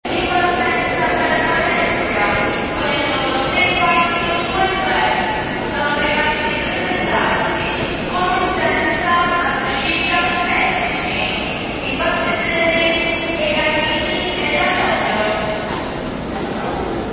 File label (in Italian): aereoporto ambience